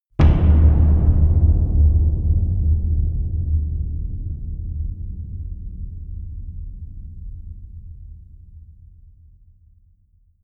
dark cavern impact, impacts; hits explosionen

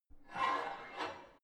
barbell heavy plate pull out quick, fitnessstudio

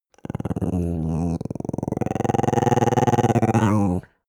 dog small growl stutter; natur-tiere; hunde

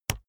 keyboard laptop enter push hard

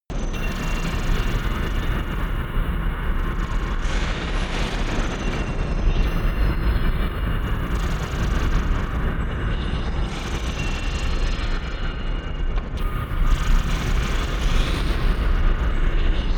purgatory chaos, horror

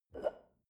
shell upside down grab from table soft; berufe; gastronomie; soundpool

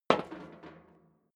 step leather shoes metal rattle single right; schritte

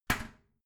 toilet seat lid slam soft, badezimmer; haus-garten

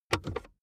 car accessories center console compartment open; autos; verkehr transport

